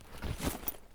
generic use; gear; Escape from Tarkov

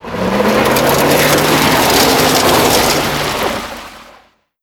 veh pickup donut dirt; Modern Warfare

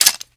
us grenade pin; weapons; Modern Warfare

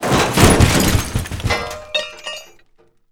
chop debris drop; Call of Duty Modern Warfare

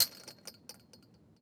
door chain link impact; Rust

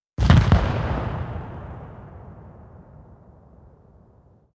expl close er, explosion; World of Tanks Blitz

